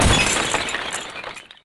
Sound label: Ice